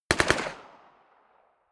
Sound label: smg uzi 45 distant burst